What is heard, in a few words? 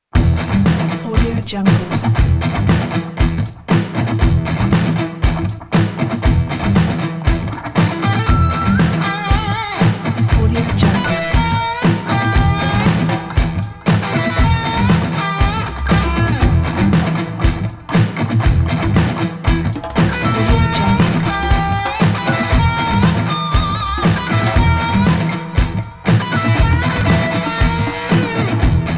spy rock; greenthingproduction